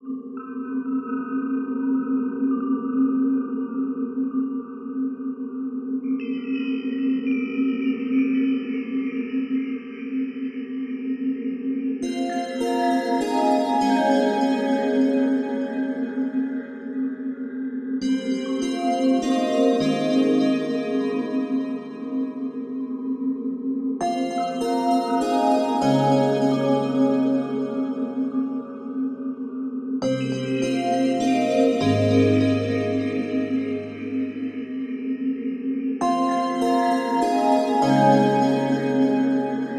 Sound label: Crystal Caves; legends of dragaea idle dungeons the soundtrack 2025